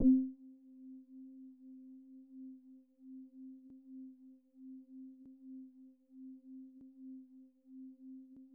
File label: Synth Basses C4; Music Store Sound Service; Einstein